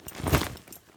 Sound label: Foley Soldier Gear Equipment Metal Cloth Heavy Movement Light